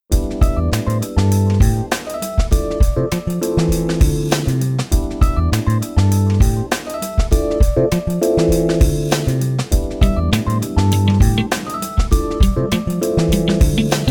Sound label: LOUNGIN FLUTES